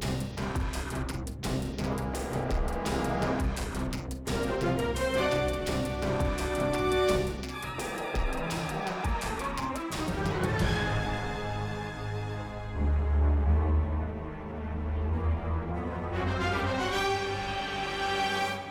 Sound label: Fight Music